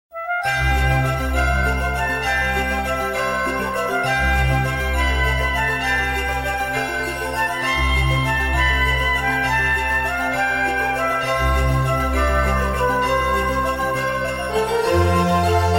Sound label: christmas ringtone